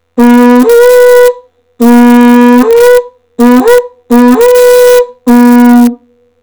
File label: cassis, horn